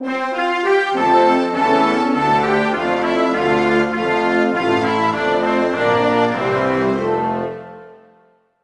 Anthem Singapore; Buzz! The Mega Quiz; PS2

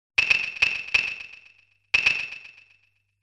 Rhythm Tree; Divertimento Hanjuku Hero

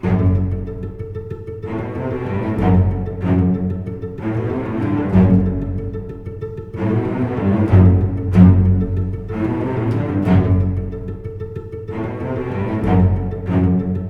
String; The Bureau XCOM Declassified

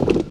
Stone Bounce

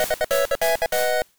Jingle, Clear; Tuner Fight - Clear 3; Nintendo DSi

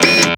grenade empty; Shattered Horizon

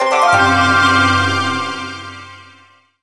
Character Unlock; ultimate mortal kombat nds 2007

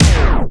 dj disco effect 1beat; Plants vs. Zombies Battle for Neighborville

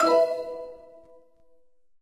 Map Star

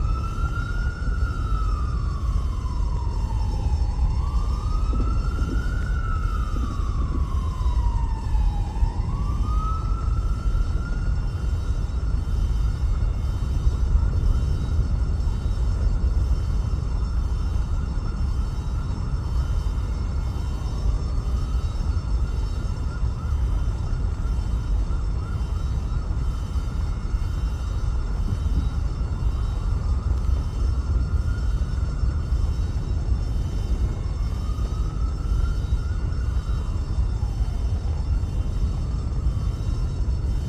Burning City Loop

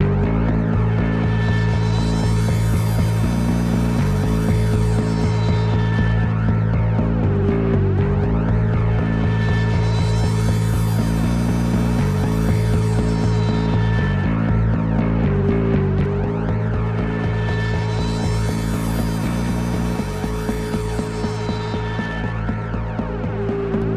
BASSSYNTH LP; transformers war for cybertron gamerip; ACTIVATE TRYP

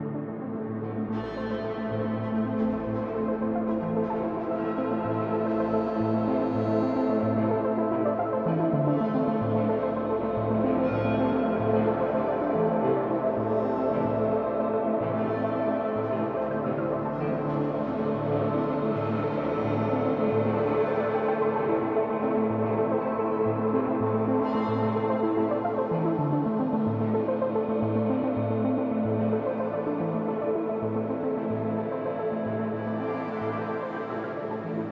LOBBY LOOP; escape academy

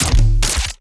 Grenade Launcher Fire Heavy

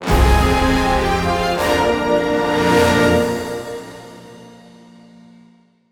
Weak Point Reveal Stinger; StormKing

